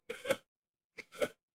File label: feedback achievement